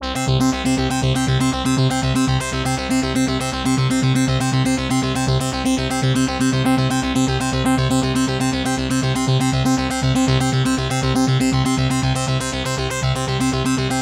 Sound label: Arp Woods